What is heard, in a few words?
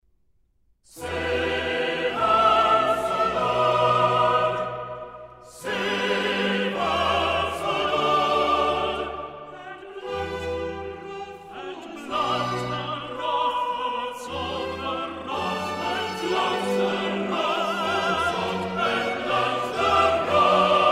Chorus Save Us O Lord; Handel; Esther; HWV 50A